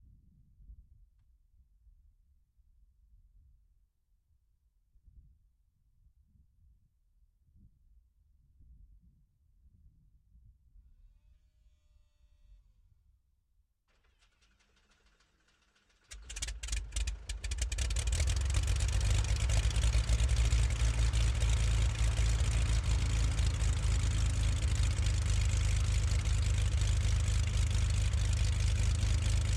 Beechcraft Baron 58 ONBRD IDLE Right ENGINE Start Stationary Idle Increasing; 1969; RPMS Off; EXHAUST; AKG-D12; Pole Position